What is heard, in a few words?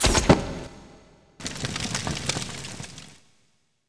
Spider Die